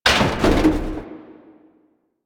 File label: Military Checkpoint Wall Destroy; Nineteen Ninety Nine